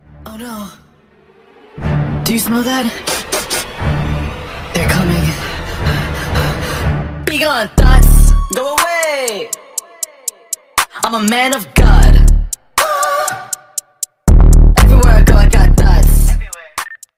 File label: English Ringtone